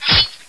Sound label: knife hit wall; cstrike